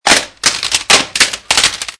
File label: tapes